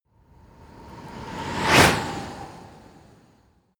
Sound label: whoosh bicycle hard